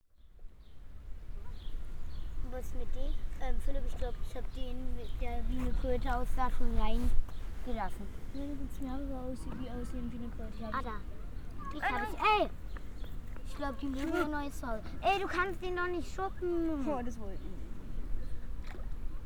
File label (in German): fontaene kinder lassen froesche frei; peisnitz; soundmaps